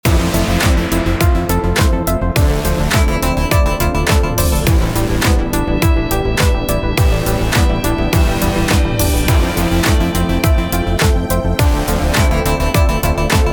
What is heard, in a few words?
Synthwave